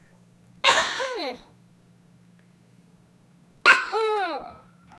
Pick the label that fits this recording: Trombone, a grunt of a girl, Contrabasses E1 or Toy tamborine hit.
a grunt of a girl